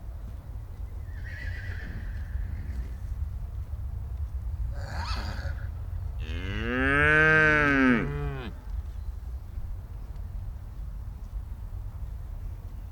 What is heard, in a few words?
Cow moo Horses neigh